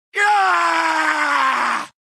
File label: male generic warcry; Voices Emotes